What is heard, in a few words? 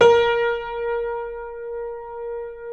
A#3 L, Keyboards Acoustic; USB Soundscan; AKAI